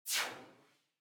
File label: scan mail send